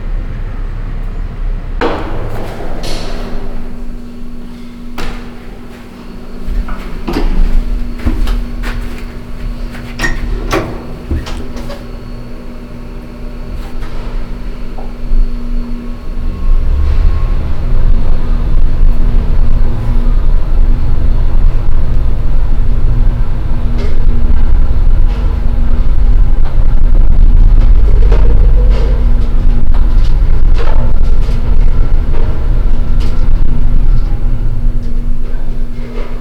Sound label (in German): auf der strasse padaria, Brasilien; Fieldrecordings